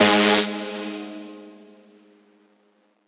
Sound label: synth screech oneshot G#; Synth Hits; GhostHack